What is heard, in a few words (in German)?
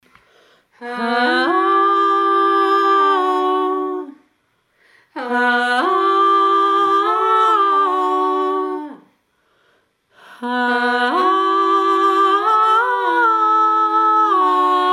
klagelied